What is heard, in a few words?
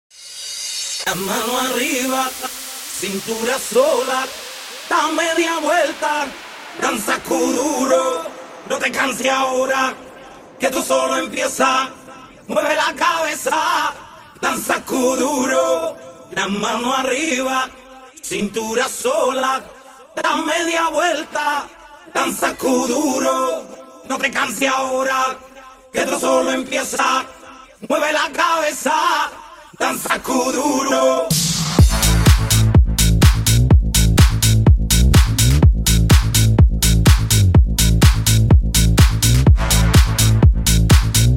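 Acapella Intro 125 Bpm; djyan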